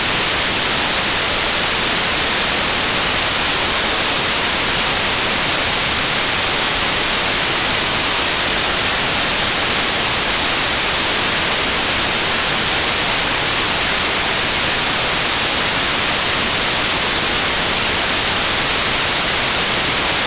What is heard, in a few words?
white noise; weight noise method